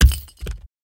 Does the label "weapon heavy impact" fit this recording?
Yes